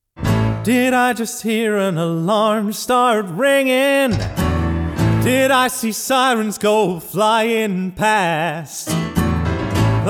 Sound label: Singing